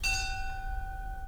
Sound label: bell